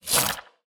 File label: Magic Attack Slash; portal quest android; Shrek